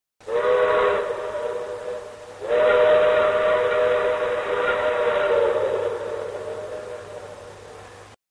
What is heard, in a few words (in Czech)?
parni vlak